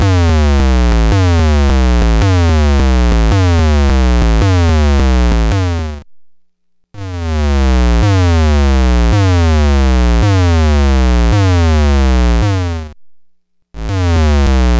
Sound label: 2A03 pulse pop fix